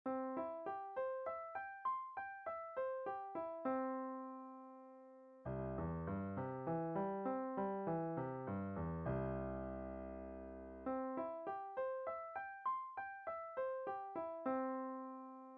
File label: Arpeggios Exercise